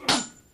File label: crit, damage